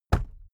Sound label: briefcase plastic put down hard; industrie wirtschaft; bueroarbeit